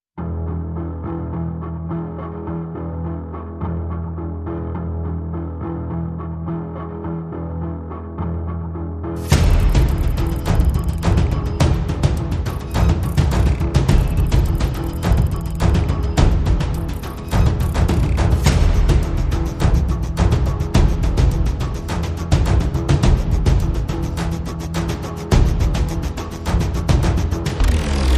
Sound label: Perc and Pulses Only